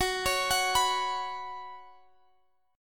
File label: Gb Suspended 4th, Guitar, Standard; Gbsus4; arpeggiated; x,x,16,18,19,19; down; capo=3